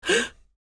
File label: Mature woman gasp shock surprise